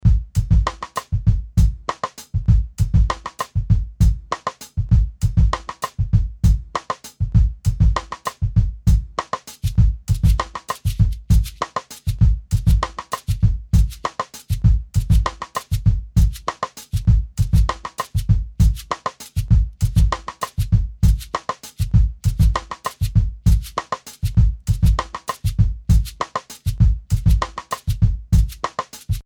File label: side stick